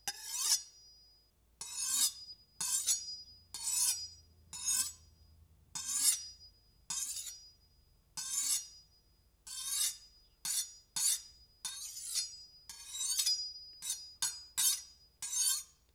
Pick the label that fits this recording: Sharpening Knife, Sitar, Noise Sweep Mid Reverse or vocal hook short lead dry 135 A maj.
Sharpening Knife